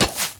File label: Archer Shot; Pecheneg